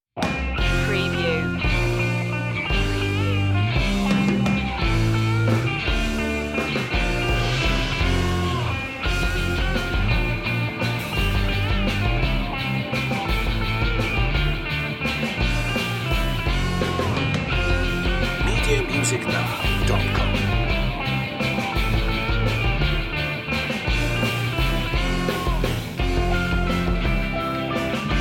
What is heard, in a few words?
we wish you a merry christmas rock